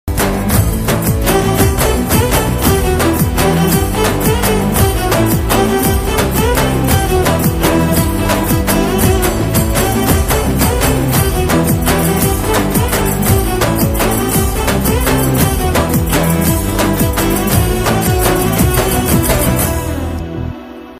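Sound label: background music ringtone new background music ringtone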